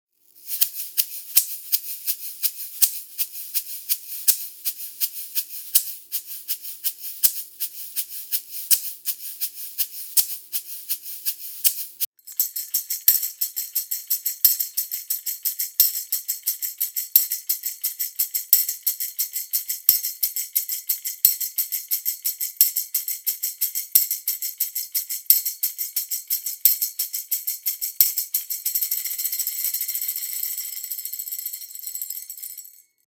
Shaker Schellenring; Austrian Audio OC7

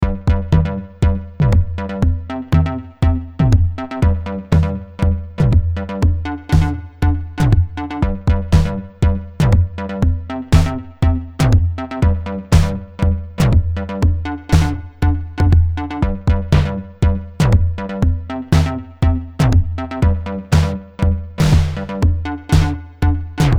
Beat nur System 100

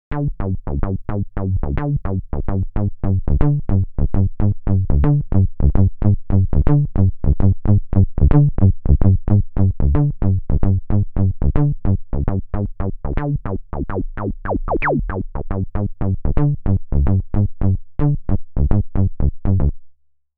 Bass Sound Saw Pulse ADSR Out Ext In Kompressor

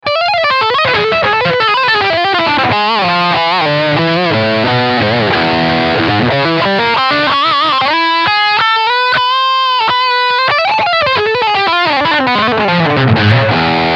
Lick Overdrive Steg Pickup

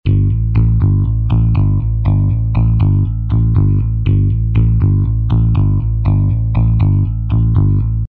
Bass mit Effekt Flanger Soft

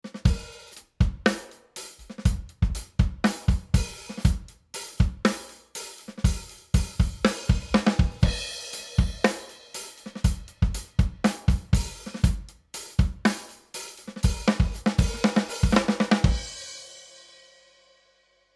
Drums Snare leiser

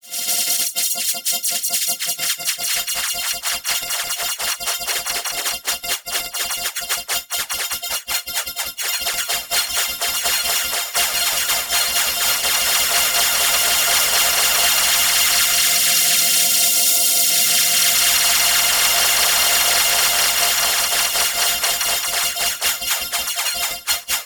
Granular synthese Sample Variation; Emu EIV classic und Ultra

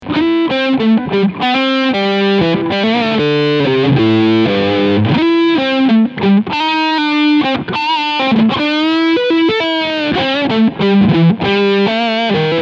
Overdrive Steg PU

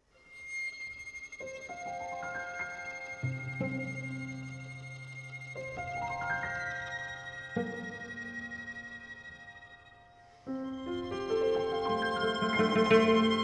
Savant Audio QRS Klassik Trio mix

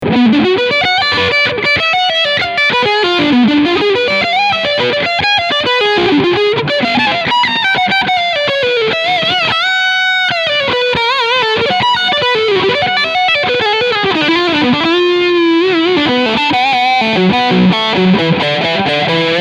Jackson SL2A MAH Pro Soloist UWH Overdrive Lead Steg PU

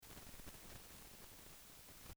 Bruzzeln; Klark Teknik CM-2; Channel B

